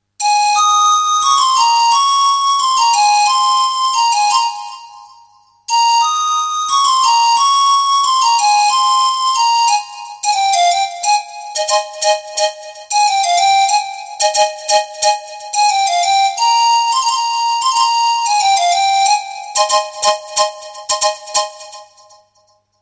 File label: flute mix; kishna